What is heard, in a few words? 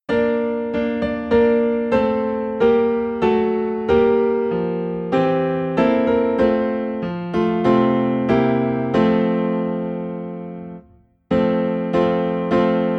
Piano in F